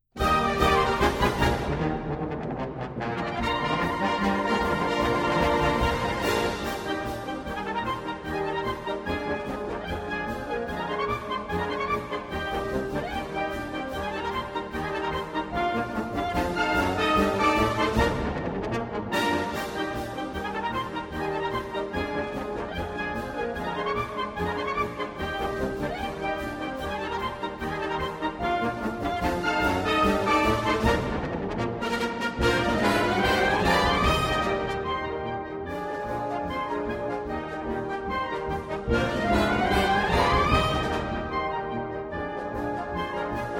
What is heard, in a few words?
melody